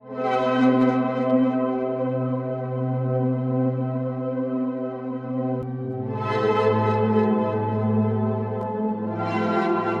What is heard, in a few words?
pad spatial motion